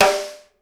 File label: SNARE